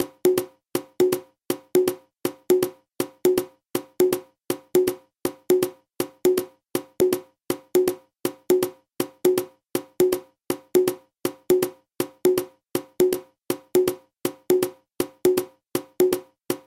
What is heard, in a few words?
columbia matanzas repicador